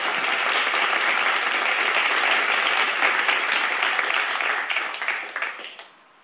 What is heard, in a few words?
crowd clapping